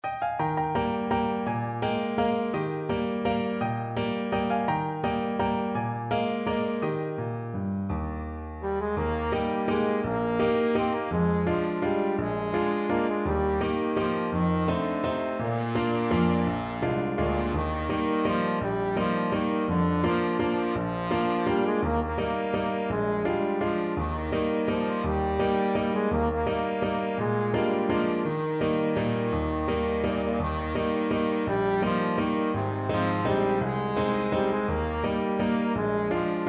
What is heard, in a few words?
biddy mulligan, trombone